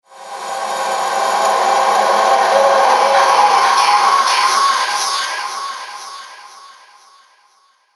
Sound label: Scary Creatures, monster sound effects; Fantasy Sound Effect; Andrew Fleming; Twistedtracks